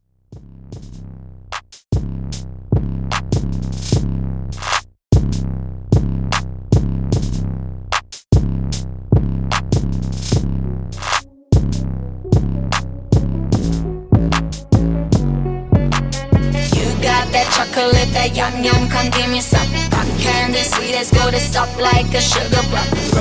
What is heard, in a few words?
Latin Bass Trap 150Bpm